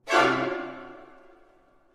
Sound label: shock jingle medium; Holy Potatoes! What the Hell?!